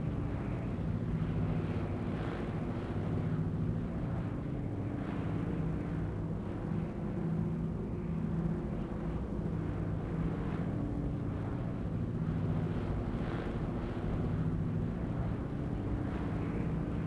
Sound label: ambience Distant Traffic; Star Wars Obi Wan; XBOX